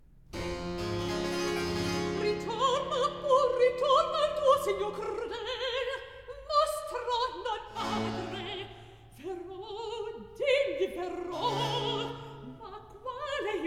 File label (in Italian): Recitativo; Ritornia pur; Handel Masterworks; Klassika; Act III